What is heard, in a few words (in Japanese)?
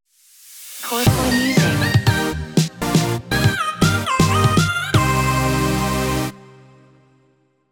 jingle ウォーター